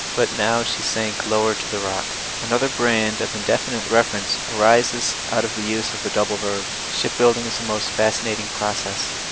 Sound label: white 5dB noisy